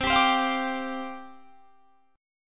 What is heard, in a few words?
C Suspended 2nd Suspended 4th, down, Guitar, Standard